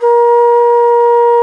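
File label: TENOR BANSURI; E-MU Producer Series Vol. 7 – Old World Instruments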